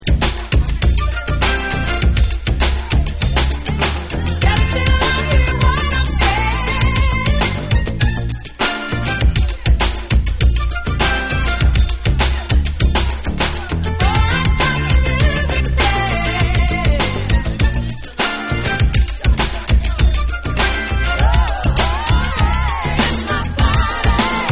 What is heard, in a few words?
Club Instrumental